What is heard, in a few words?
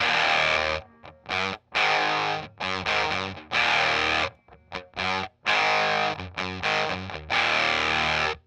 bridge rhythm high crunch